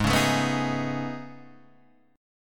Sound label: A Major Sharp 11th, G sharp